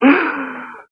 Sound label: OOH, Spoken; Sonic Foundry (Sony Creative Software)